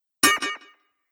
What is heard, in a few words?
Robot Rusty Clang, sci-fi robot sound effect; Robot Sound Effects